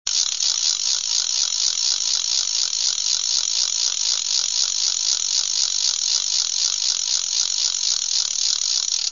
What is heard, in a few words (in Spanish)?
rasca; Güiro; Raca-raca; So indeterminat